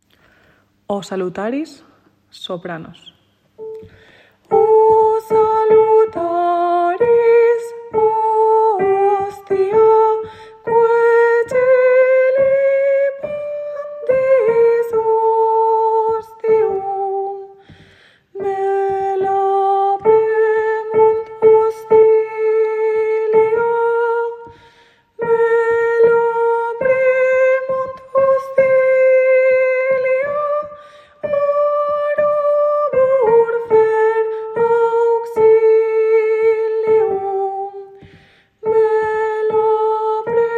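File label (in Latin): O salutaris soprano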